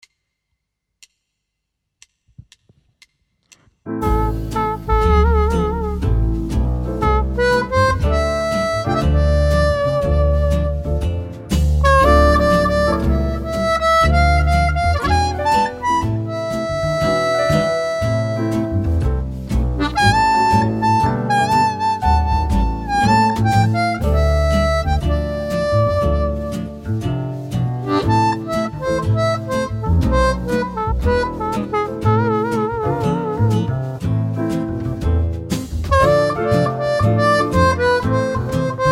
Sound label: 120 harp